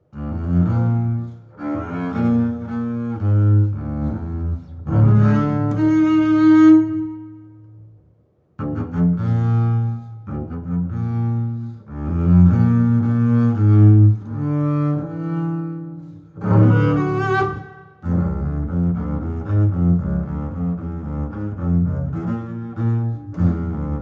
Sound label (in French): Contrebasse solo; Cycle des siecles